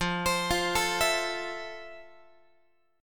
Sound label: F Major 7th F, Guitar; arpeggiated